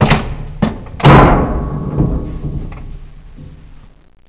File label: light switch